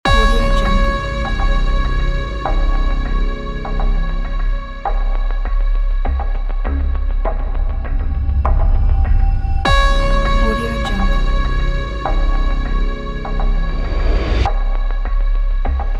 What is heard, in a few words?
dark intense trailer; soundblasts